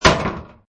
Shield Impact

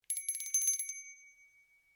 Bell Dinner Bell Ring, tiny dinner bells; Musical

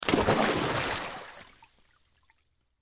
Splash Dive Pool, Water Splash Dive Pool; Backgrounds; Blastwave

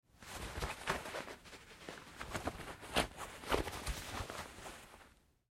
Foley, Fight Moves, Pillow Case, Struggling; Wrestling And Struggling

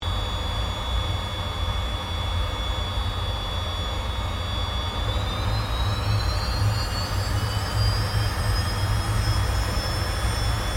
AIRCRAFT CA-27 SABRE TAXI INTERIOR; CA-27/F-86 Sabre; Airplanes; Vehicles

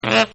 SAX Riff; Horns; Sound Effects:Musical

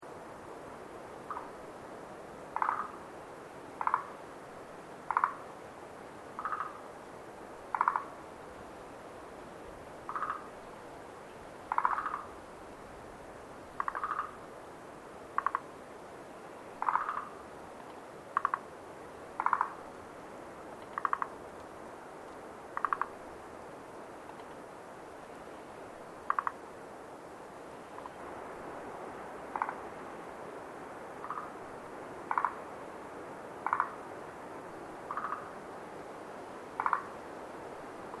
Animal Frogs Tazawako Night Wind Loop; frogs recorded in different locations over time; Miscellaneous Animal Sound Effects

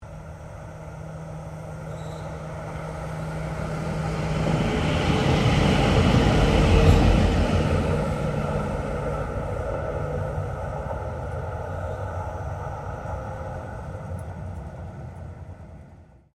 Tram Melbourne C Class Passing Slow; Various Melbourne trams; Streetcars; Vehicles

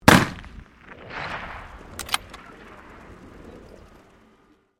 RIFLE 303 MK1 FIRING; 1942; Rifles, military; Guns

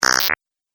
Alien Robot Game Fx

